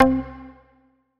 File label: synth one shot c